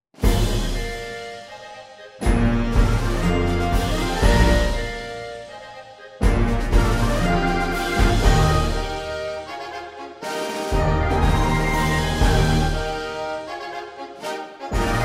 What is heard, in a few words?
Exuberance woodwind